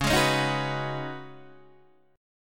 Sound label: Db 9th Suspended 4th Db Guitar Open D